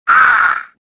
choke

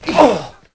dead, male; voices; Pirates Of The Caribbean

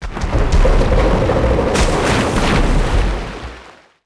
bridge fall water; impacts explos

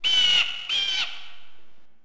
bird; Tony Hawk's Project 8; XBOX

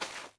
fs sand, footsteps

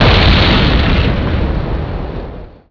Explode; Tony Hawk's Project 8; XBOX

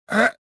jump; jedi

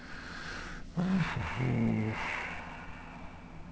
sigh; Pirates Of The Caribbean

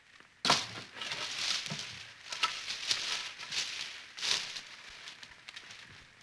grass, NATURE; Pirates Of The Caribbean

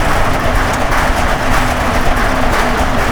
roll metal tin; terrains; Tony Hawk's Project 8; XBOX

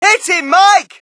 crowd, shout; Mike Tyson Heavyweight Boxing

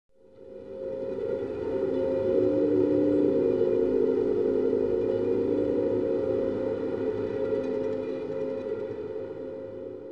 stinger; Myst III Exile

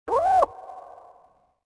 creature, ambience; yavin; shakk

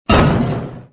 pipe land; movers objects

